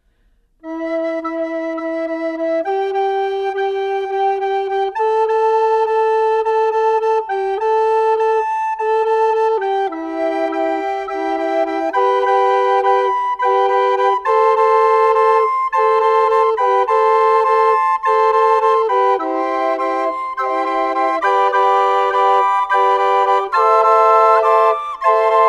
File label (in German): Seelenklang Floeten